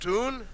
tune

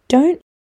trill

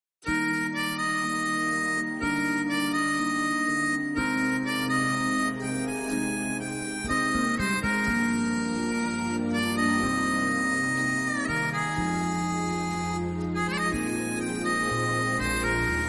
congas; merlins

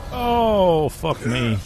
aah

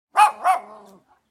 dog bark

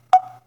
android ringer volume beep

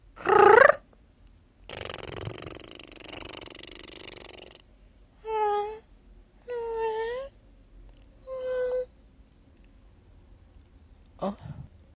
sensual cat noises

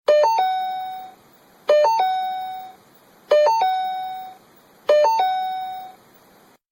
ford mustang door chime

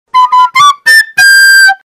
theme flute; mcdonalds

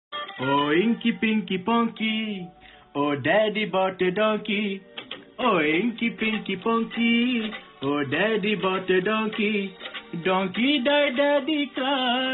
indian donkay songa

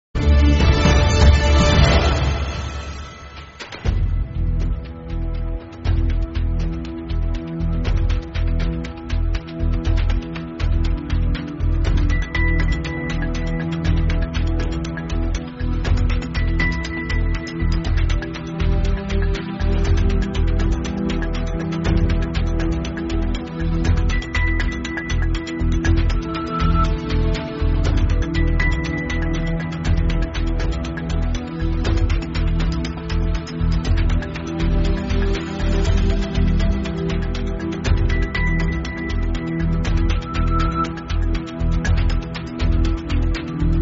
news bg

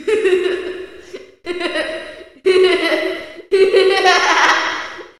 creepy kid laugh; fnaf

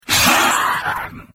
demonic hit

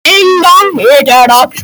ding dong ok

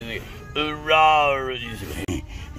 uh rawr swing

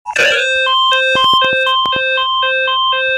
india eas warning sound

